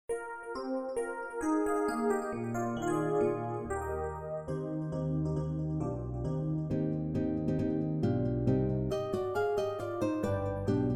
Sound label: Elevator March Relax